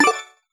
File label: menu click; Crimson Stella